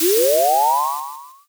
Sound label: firework launch